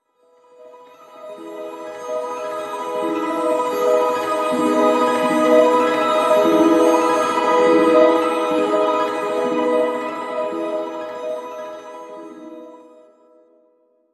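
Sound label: song of discord melody; sona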